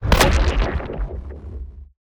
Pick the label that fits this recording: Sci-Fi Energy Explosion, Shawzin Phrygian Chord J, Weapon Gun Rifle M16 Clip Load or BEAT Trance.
Sci-Fi Energy Explosion